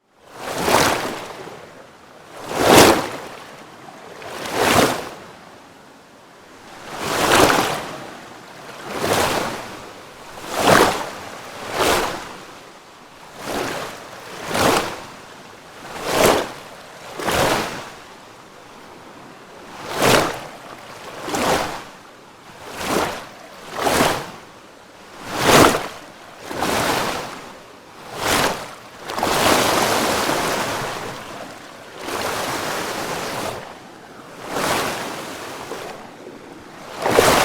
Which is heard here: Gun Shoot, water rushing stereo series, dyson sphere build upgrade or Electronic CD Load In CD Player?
water rushing stereo series